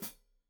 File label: Hat Foot, Hi-Hat, Cymbals Stereo